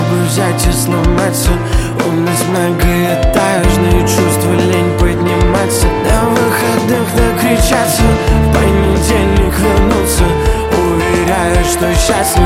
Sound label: Acoustic Version